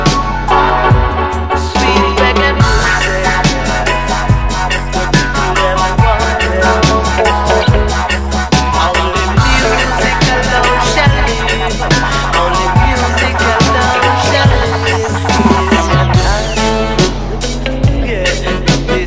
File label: heavy reggae